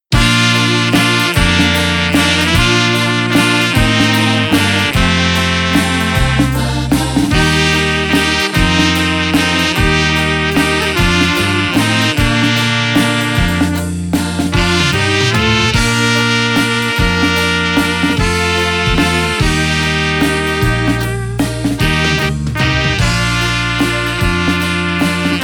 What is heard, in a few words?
Waltz